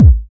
round kick sample d key, kick drum one shot tuned to the D note